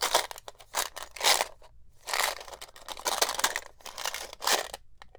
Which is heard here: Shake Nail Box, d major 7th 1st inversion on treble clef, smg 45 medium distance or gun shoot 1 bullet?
Shake Nail Box